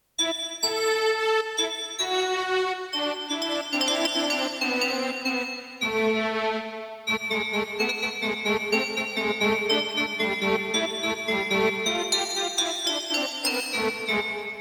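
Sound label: A minor Glock Strings; GlockString sample (Sylphyo on Ketron SD1000); Violin concert A-mol intro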